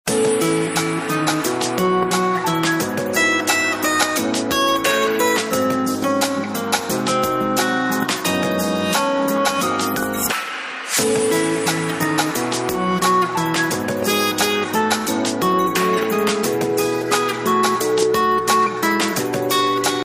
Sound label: speaker test; LG; AN270-Prestige